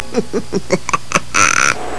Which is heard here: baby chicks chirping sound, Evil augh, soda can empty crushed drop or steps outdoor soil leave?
Evil augh